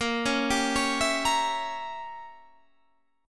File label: A sharp Diminished 7th Guitar Standard; 18,16,17,18,17,18; arpeggiated; JGuitar